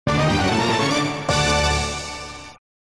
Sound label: Fireball Victory; tales of the arabian nights williams pinball soundtrack